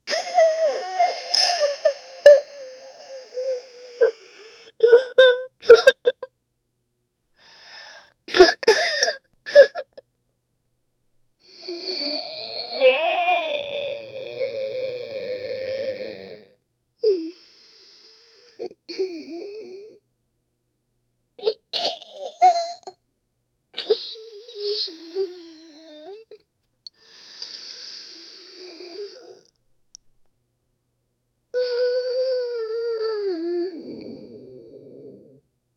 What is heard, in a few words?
muffled panicked and loud; adult